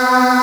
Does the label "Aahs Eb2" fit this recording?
Yes